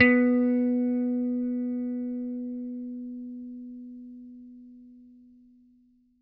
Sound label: GOOD 80 PICK B3, Bass; Bass Mace; DirectWave